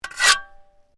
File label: Shovel Scrape